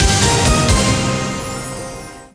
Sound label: stab